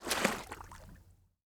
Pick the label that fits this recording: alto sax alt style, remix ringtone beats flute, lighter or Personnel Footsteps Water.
Personnel Footsteps Water